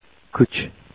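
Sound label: kick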